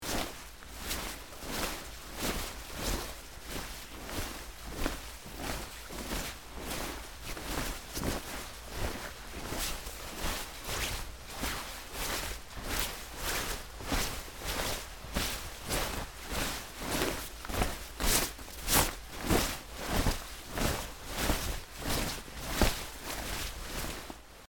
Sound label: Heavy Cloth Rustle; Heavy Cloth Rustle And Movement For Walking Or Dancing